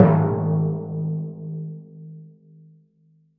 timp; musicradar; cartoon caper

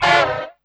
brass, Drum & Bass 160 BPM; Ueberschall; Drum & Bass Flash Trackz

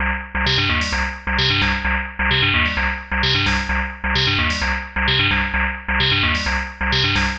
130 Cm synth, Synth Loops, Trance

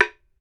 BAMBOO, World Assortment

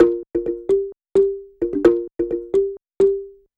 Marimba 130bpm C, African

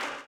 CLAP, blues & soul; ILIO; Vocal Planet

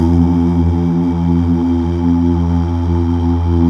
Mal Uuh; Best Service Gigapack 1

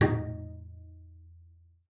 bass, D#4; Xsample VOL-5

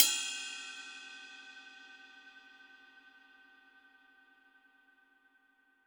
DW Green Maple Ride Bell; Sonic Foundry (Sony Creative Software); Vital Drums

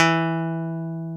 E-2 PICK, bass; AKAI S-Series CD-ROM Sound Library VOL-8

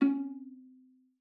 C#4, VIOLA PIZZ; Xsample VOL-5; 90 s Sample CDs

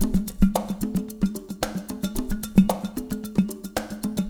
AMB; Spectrasonics; Liquid Grooves 1 & 2

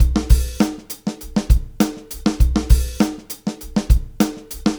Live Beats, R&B Drums

